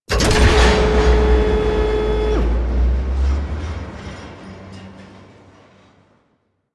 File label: Elevator Start; Warframe; Venus Open World; Venus Solaris Elevator